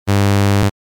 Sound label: buzzer